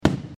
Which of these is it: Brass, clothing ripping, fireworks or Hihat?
fireworks